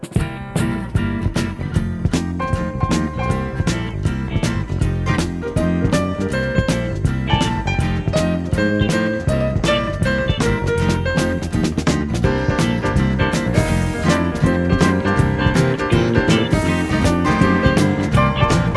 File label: Disco; Casino Inc